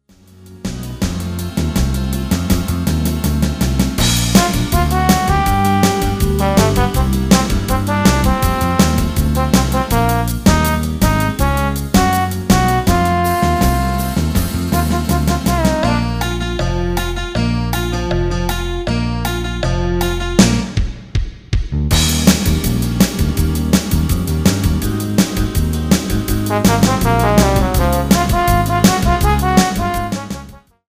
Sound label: No lead trumpet